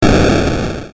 explosion; maoudamashii

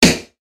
battle; maoudamashii

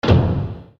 door; maoudamashii

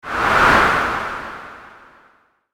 element fire; maoudamashii

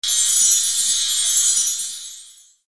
magical; maoudamashii

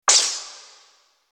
battle gun; maoudamashii

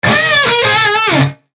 instruments guitar; maoudamashii

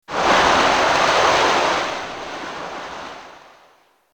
element water; maoudamashii

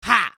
voice human; maoudamashii

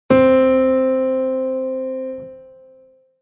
instruments piano do; maoudamashii

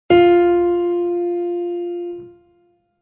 instruments piano fa; maoudamashii

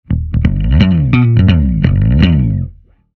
instruments bass; maoudamashii

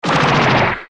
magical; maoudamashii

